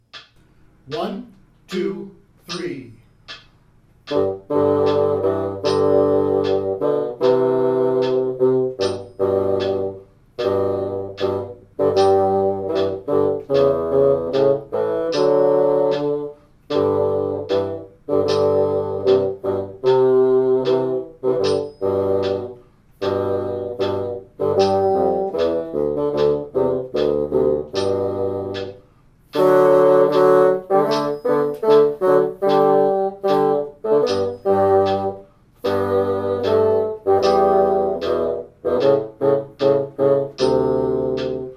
Carry Bassoon 1 and 2 76; Parts 2 and 3; "Carry Me Back to Old Virginny"; Rubank Elementary

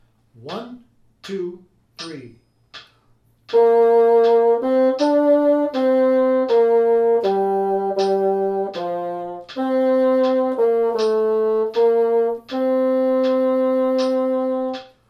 Alma Bassoon 2 80; Part 1; Rubank Elementary

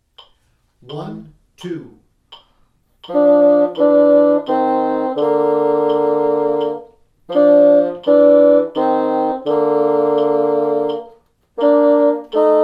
German Bassoon 1 and 2 84; Rubank Elementary

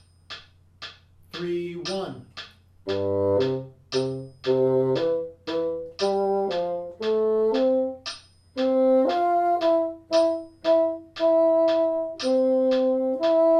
Symphony Bassoon; Part 1; "1st Symphony"; Rubank Elementary

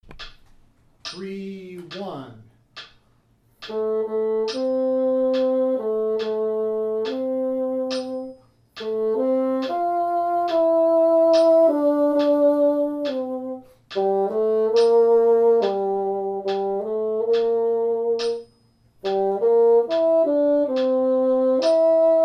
Lullaby Bassoon 3 70; Part 1; Rubank Elementary

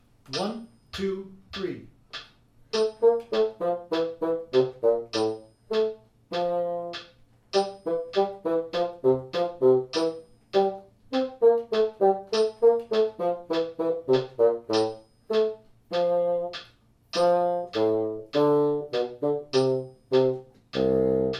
Gavotte Bassoon 2 100; Part 2; Rubank Elementary

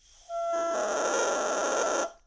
Crow, bassoon reed; The reed had a string wrap.; Ewell

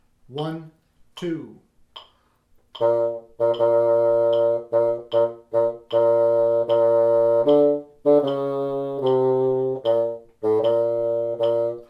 Polish Bassoon; Rubank Elementary

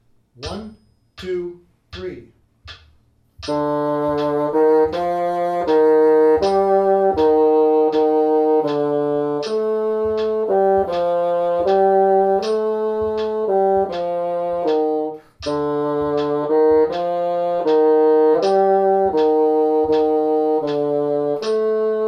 Alma Bassoon 2 80; Rubank Elementary

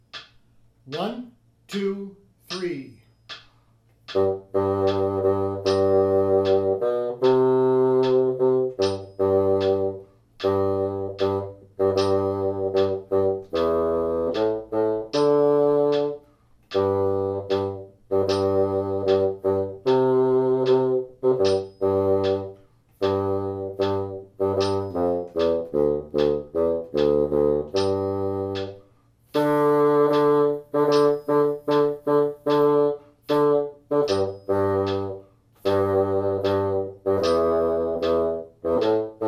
Carry Bassoon 1 76; Part 3; "Carry Me Back to Old Virginny"

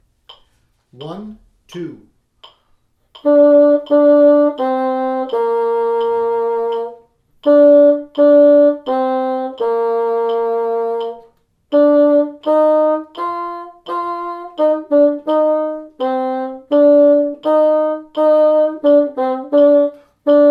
German Bassoon 3 84; Part 1; Rubank Elementary

